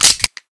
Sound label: Manually Actuated Malf; Weapon Parts Overhaul